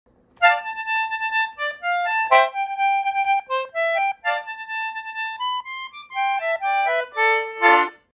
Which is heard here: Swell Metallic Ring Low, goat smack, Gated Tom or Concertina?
Concertina